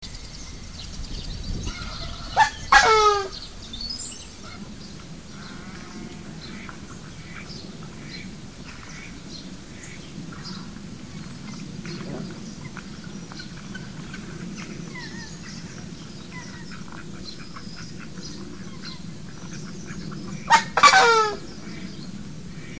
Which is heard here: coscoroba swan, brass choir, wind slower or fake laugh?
coscoroba swan